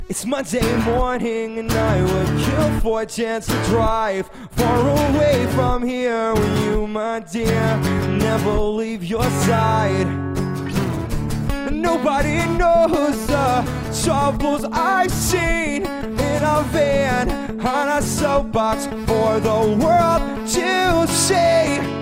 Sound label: Acoustic